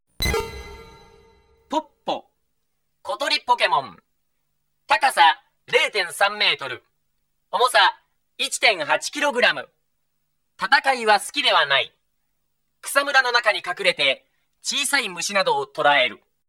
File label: pidgey, Pokemon Gameboy Sound; Pokemon Gameboy Sound Collection